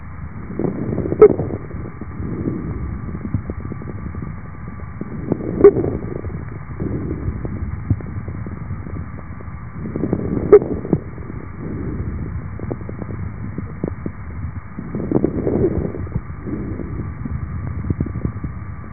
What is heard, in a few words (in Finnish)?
vingahdus, hengitysäänet; ääninäytteitä auskultaatiolöydöksistä